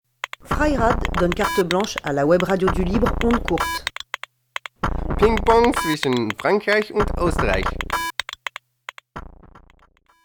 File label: jingle; carte blanche freirad